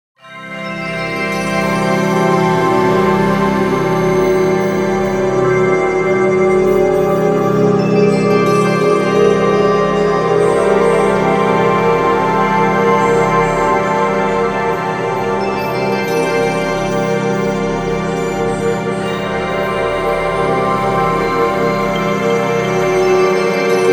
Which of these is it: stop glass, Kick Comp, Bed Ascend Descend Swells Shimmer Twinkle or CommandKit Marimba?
Bed Ascend Descend Swells Shimmer Twinkle